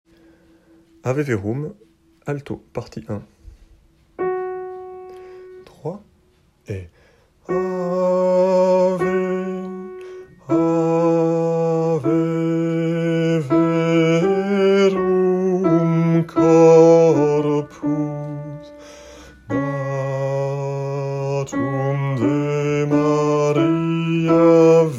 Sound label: Ave verum alto; Mozart; Ave verum P1